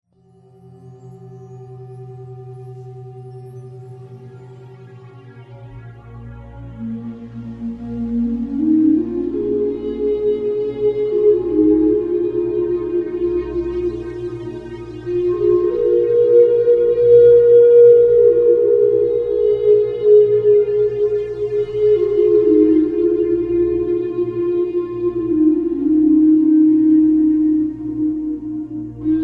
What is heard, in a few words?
Mystic Trance